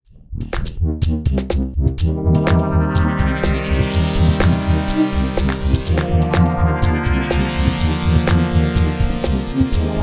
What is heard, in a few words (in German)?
Zynthian Open Sound Plattform Ensemble OBxd